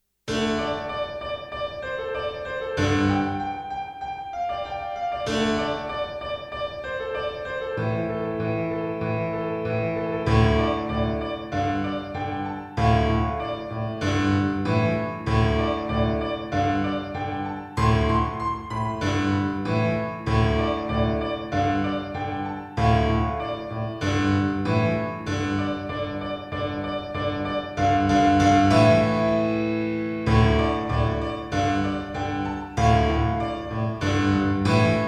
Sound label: piano pop